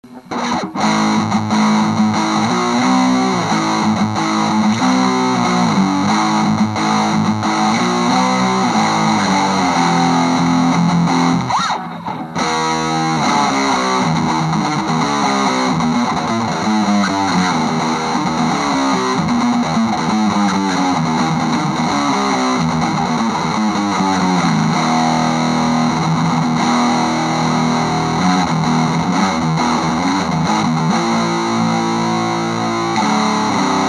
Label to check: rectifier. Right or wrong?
Right